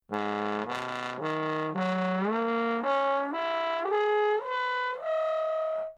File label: trombone